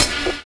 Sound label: HIP SAX; Univers Sons